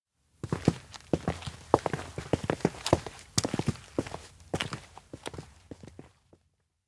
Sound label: steps indoor observatory enter; awayuki; anemoi パッケージ版 1.1